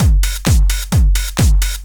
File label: straight, Techno Trance Essentials drum loops